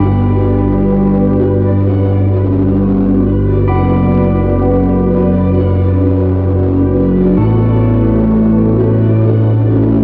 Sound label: bunch of weasals, loops electronic